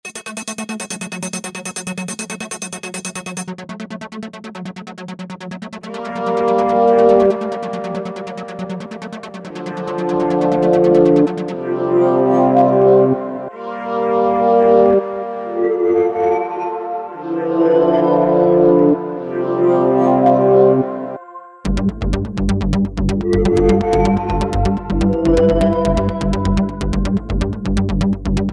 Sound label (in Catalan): composició loops